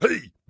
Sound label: Pirate Barrel; rabbids multiverse; iOS, macOS, Mobile